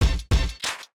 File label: 90s Hit, notifications; Samsung; Galaxy A56; Retro